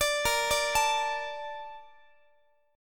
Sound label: A sharp Major 7th, Guitar; arpeggiated